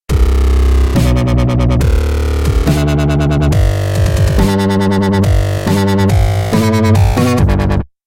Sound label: dubstep wobble